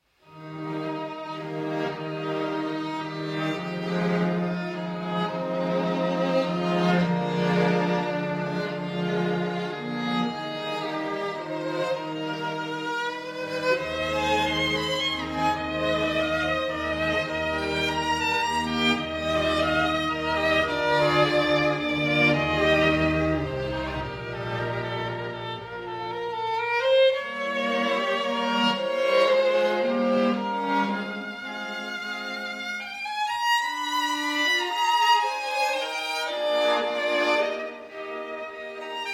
Cello Sonata, Andante Sostenuto; Op. 46 II.; Arr. For Violin and Str

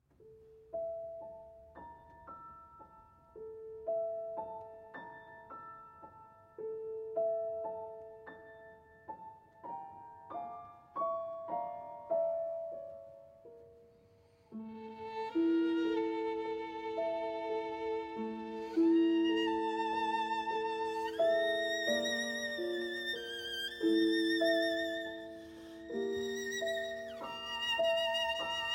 Violin

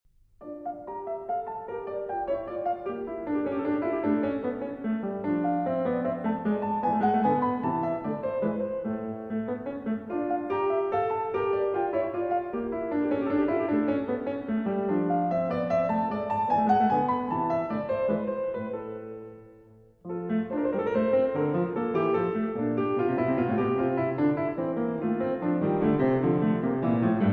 French Suite No. 1 in D minor

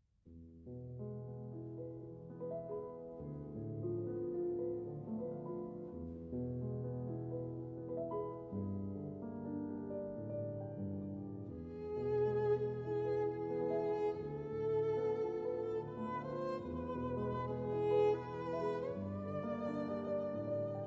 Violin and Piano; Debussy; Beau soir, CD 84, L. 6; Arr. Heifetz

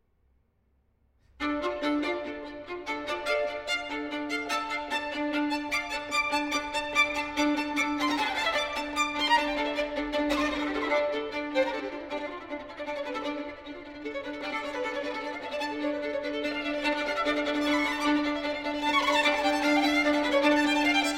Concerto Grosso In D Minor

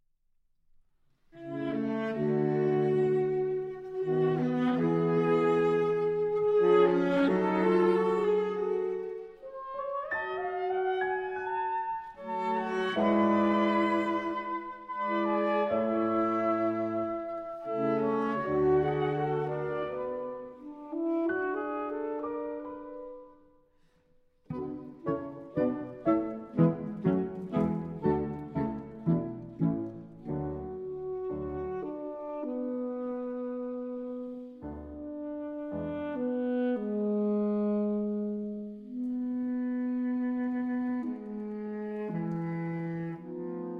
Cello, Piano & 4 Sax; Tchaikovsky Variations on a Rococo Theme, Op. 33 (Arr. Malzew for Cello, Piano & 4 Saxophones)

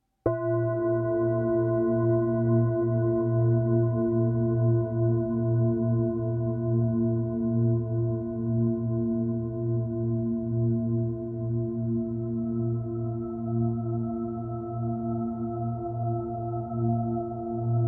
Singing Bowl; Singing Bowl (Ascension) (Excerpt)

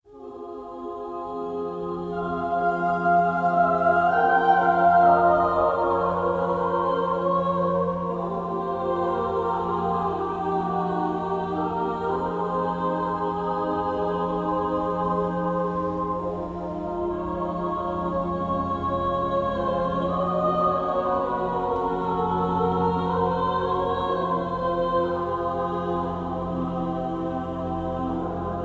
Choir and Orchestra

